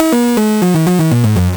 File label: Beat